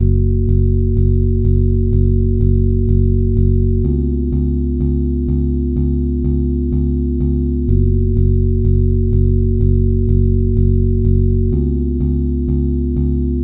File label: Zen Waves 1 to 5 in Gb; Mike Cirillo; Musical Self-Actualization; Mike Cirillo Method TMSA